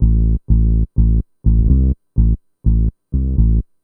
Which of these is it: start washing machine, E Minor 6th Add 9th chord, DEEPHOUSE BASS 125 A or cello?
DEEPHOUSE BASS 125 A